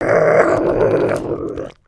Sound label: Stun; Skullkin; creatures organic